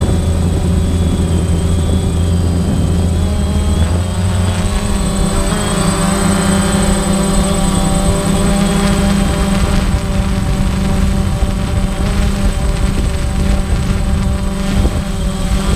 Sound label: drone sound effect